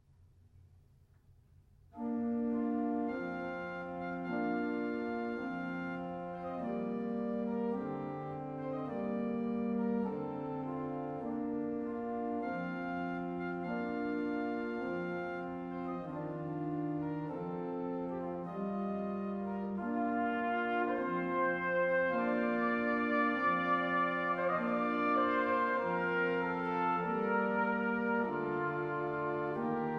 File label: Suite For Organ, Brass Quintet, & Percussion Cantilene